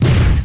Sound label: punch